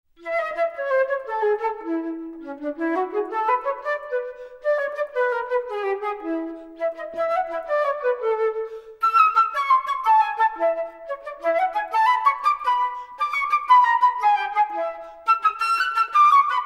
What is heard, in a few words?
Trad Mexican performance Flute; ABRSM